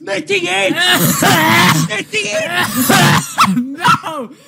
cough

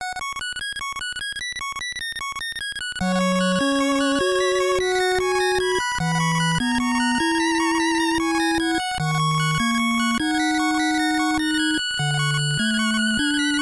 space music

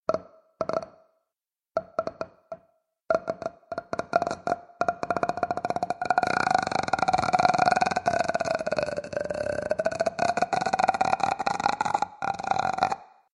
ringtone

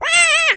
burn

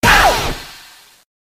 parry sound; pizza tower

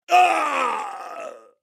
k o scream; mk9; sub-zero

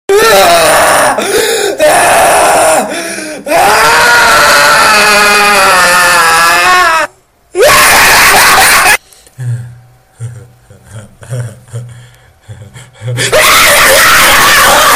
haha

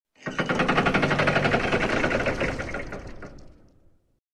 medium gear

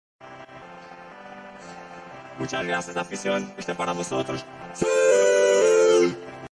siuuuuuu

